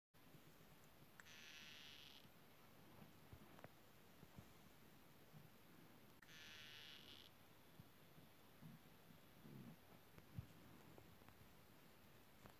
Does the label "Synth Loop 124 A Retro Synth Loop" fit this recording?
No